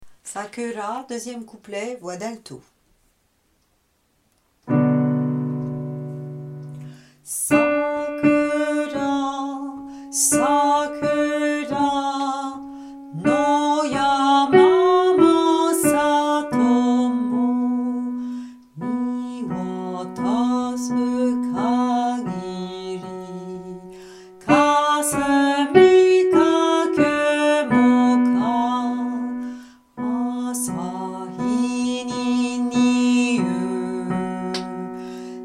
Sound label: sakura couplet 2 alt